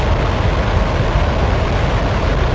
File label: booster thruster raw; OrbiterSound